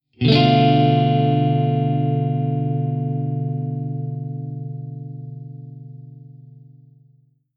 Acorde de Tetrade de Bm7 b5

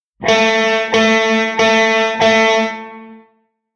Sustain BAIXO

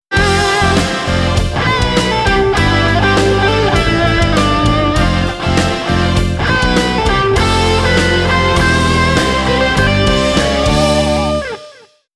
Blue Tone Solo do Riff, guitar

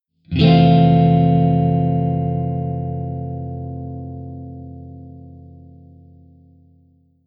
Acorde de Tetrade de F7M

